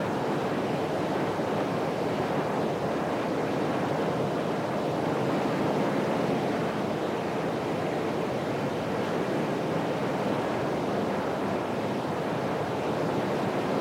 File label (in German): Windmaschine